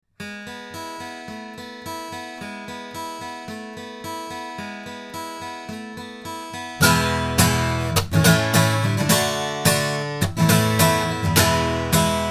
Sontronics Helios 8 guitar, microphones recordings